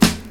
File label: 00s clear hip hop snare single hit a key, Royality free snare sound tuned to the A note; snare-drum-samples